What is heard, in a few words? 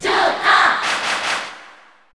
Cheer Korean; Joker; SSBU